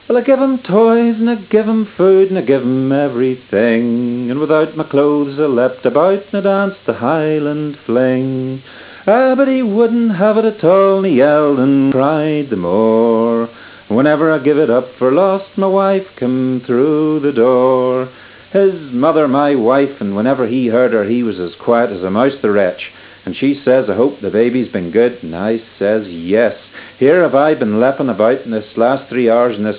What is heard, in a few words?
roaring baby